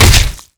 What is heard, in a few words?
hit; Garrys Mod